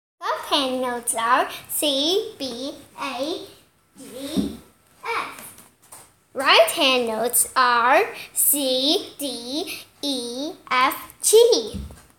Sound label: Piano notes; Identifying Piano Notes from Piano Lessons